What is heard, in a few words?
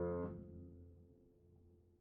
release F1, Upright Piano; Chordophones; Knight; Releases